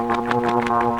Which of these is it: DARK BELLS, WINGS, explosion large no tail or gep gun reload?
WINGS